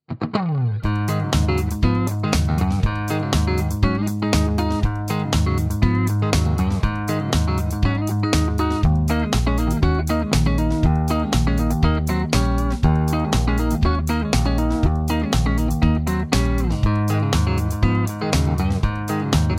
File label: clean neck, Tele; schwarzbach; VP-Tele-Pre55